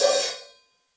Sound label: crash choke soft, drums single hits